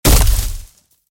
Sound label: Creature Enters